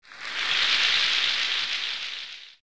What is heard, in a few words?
Applause; super world court; namco na 1; arcade gamerip 1992